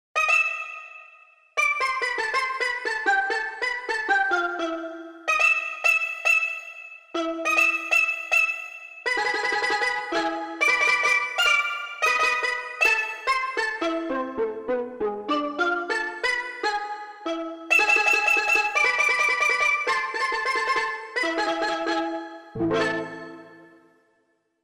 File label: Steel Harp; Korg MSC-1S Variety